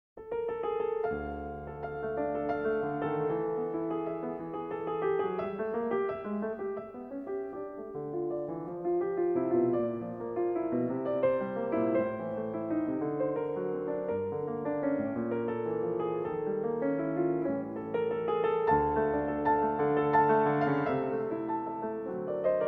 Venezuelan Waltzes

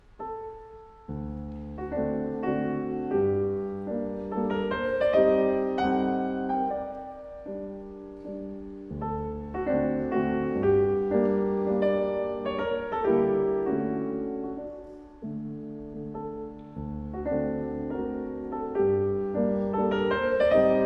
Mazurka in G minor; Anna Fedorova

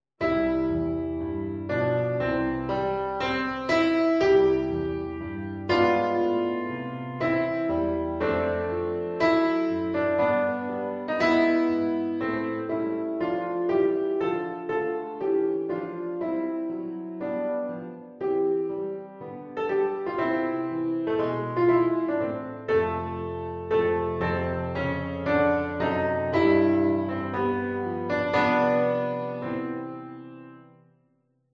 Andante in C Major; A short extract from Andante in C Major

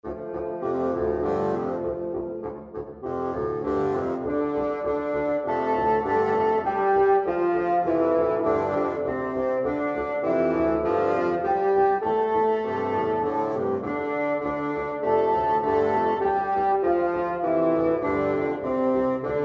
Boogie Woogie Style; God Rest Ye Merry Gentlemen

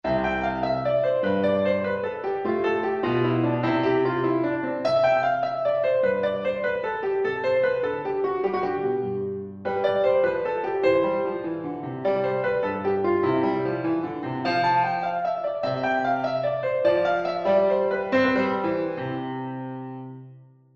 Gigue; Arnold

